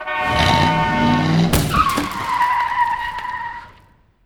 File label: border truck wreck; Papers Please